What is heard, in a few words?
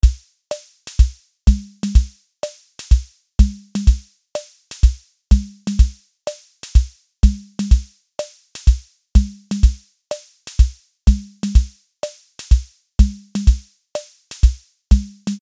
latin swing; tiny pops; superwave